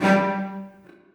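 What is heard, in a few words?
celli staccato f#3, Symphonic Orchestra; Sonatina Symphonic Orchestra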